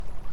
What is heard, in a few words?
whistle